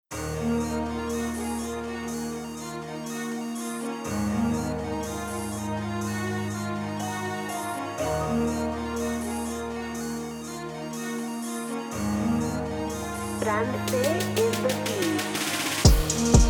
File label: beat 61 bpm; TORNEO CHILETON